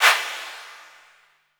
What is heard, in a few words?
CLAP -L, Drum & Bass; Ueberschall; Drum & Bass Resonance